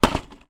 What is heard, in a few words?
household medicine tablets pack foil box down on table; zapsplat